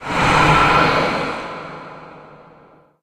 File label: souls receive; video games; source engine; tf